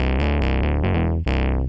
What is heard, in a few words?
Baaa Daaa, Bass